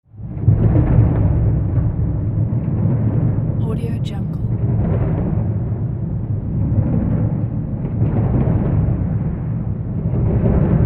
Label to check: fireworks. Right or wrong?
Right